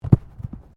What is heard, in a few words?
Body movement Tumble Light